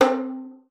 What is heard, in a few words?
FING C4, VIOLIN; Xsample VOL-5